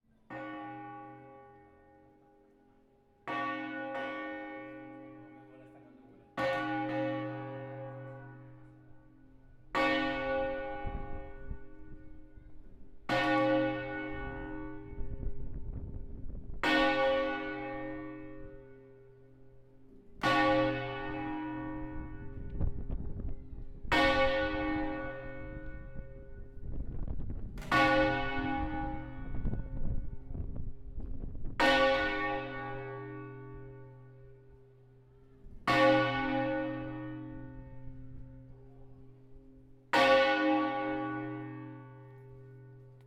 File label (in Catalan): campana